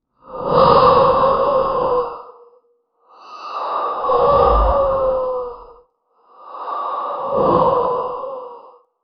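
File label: animatronic monster breathing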